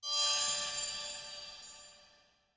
win Sym Fade; imperialriches

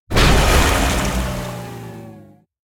wild land

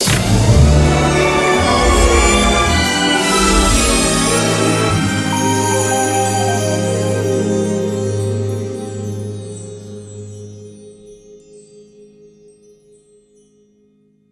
start Screen Continue; secrets of christmas

